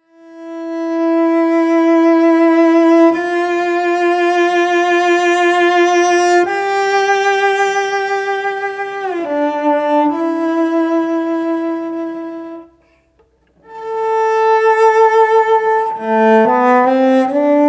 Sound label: Ave Maria, cellist